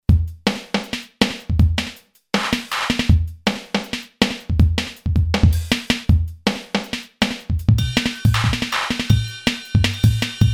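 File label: DRUM'N'BASS, Boss DR-3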